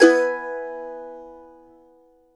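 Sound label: mandolin major, Country; Sampleheads; Whole Lotta Country 1 & 2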